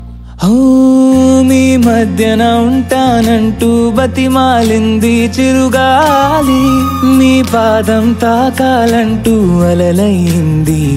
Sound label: telugu ringtone